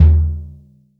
KICK, JAZZ KICK

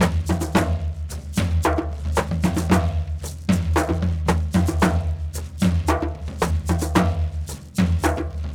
Bag Pipe Groove, Rattle, Brekete, Djembe

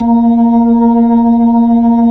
B-3 A#4, Jazz; Keyboards of The 60's and 70's

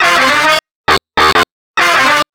Techno Effect Sect, Effected Horn Section; Sonic Foundry (Sony Creative Software); Jazz Solos and Sections

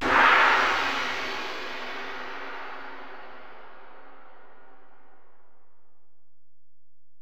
Ride, Alesis DM-PRO, Drum Machines

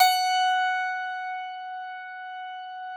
Harpsichord +ped, F3; Classical Prepared Pianos; Best Service ProSamples vol.53; Total Piano

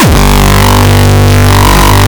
kick

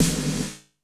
GT S909, GATE

